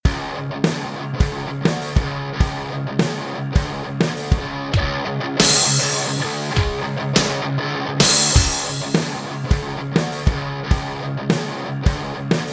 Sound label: odd beat